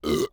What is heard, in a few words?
burp; OPENAIR; Anechoic